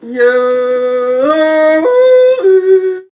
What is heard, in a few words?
yodel